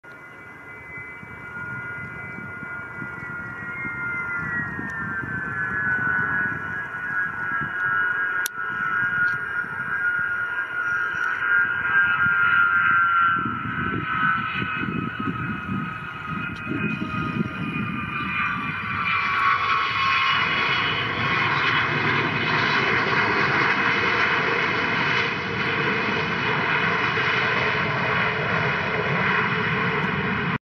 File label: Tupolev Tu-154; توپولوف ۱۵۴